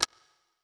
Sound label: click; ImpGal2